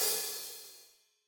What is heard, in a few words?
drumset f; Golden Crayon Sounds